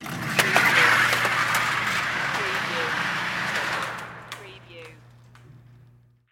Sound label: Shooting Range Target; sound effects bible